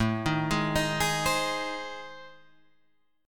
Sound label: A Minor Major 7th Flat 5th, Guitar Drop D